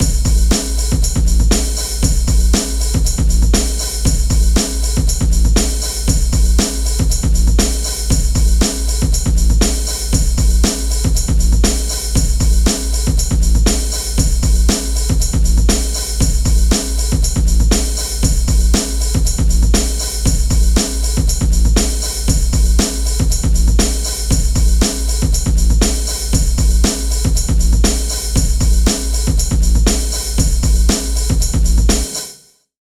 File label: 118.5 Hardlow, breaks; Lockdown Breaks